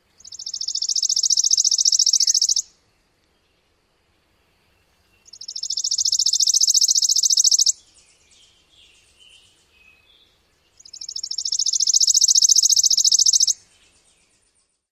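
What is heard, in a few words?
songs, Eastern Wood-Pewee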